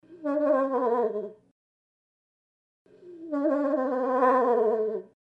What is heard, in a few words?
Cow In Heat Ready, Moose